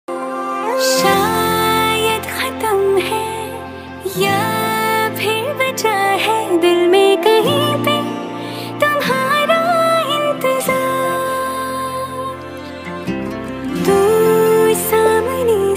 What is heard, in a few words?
Tune female voice Ringtone